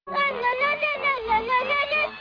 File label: lalalala